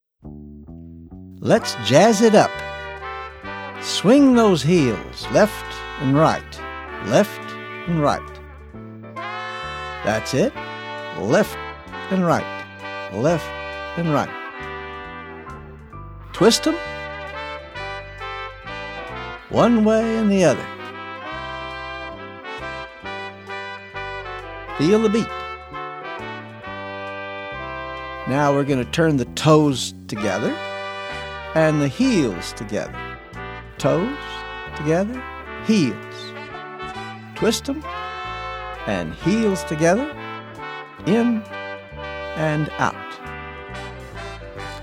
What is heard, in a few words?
Parachute Activities Jazz Steps